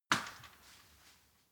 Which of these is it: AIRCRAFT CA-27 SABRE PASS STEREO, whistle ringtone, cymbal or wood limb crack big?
wood limb crack big